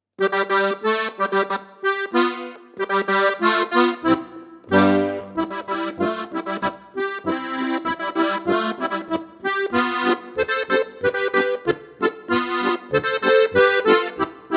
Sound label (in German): Boarisch